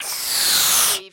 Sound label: CARTOON INFLATING